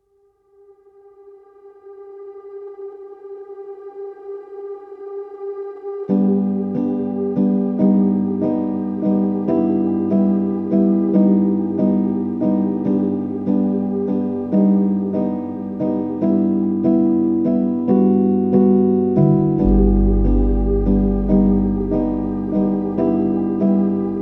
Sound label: piano chromatic